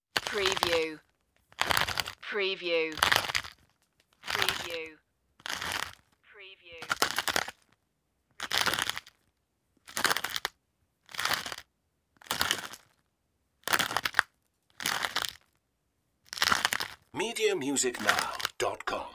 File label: pepper mill grinding